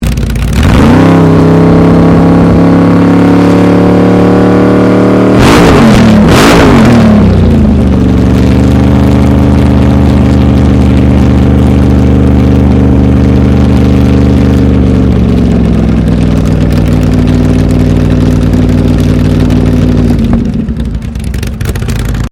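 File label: Engine Chevy C5R 7 litre Idle High Rev Close; Army recruiting vehicle; Trucks; Vehicles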